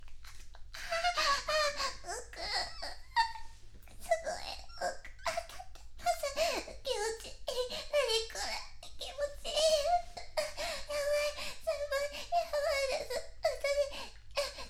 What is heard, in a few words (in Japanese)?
キス フェラ; お誕生日プレゼントは年下彼女と朝まで濃厚エッチ; ゴムもうないけど、どうしよっか。; 挿入; 中出し